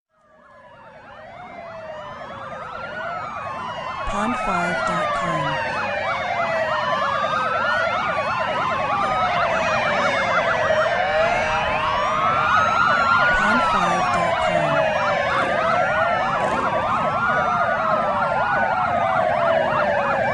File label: Siren